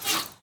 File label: arrow flyby; smite; ahpuch skin10